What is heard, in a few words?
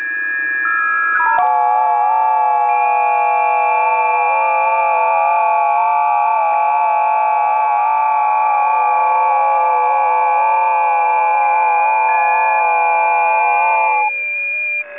20m busy, ft8; ft8 lib; rpitx